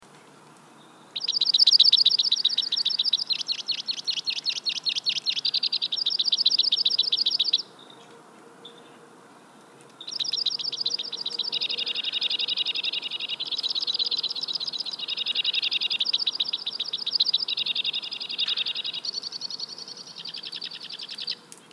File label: White-winged Crossbill, Loxia leucoptera; finches